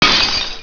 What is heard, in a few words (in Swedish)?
Krossa glas; Dubbelmoral!